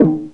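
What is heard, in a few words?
electronic bong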